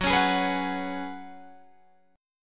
F sharp Suspended 2nd Suspended 4th, Guitar, Open G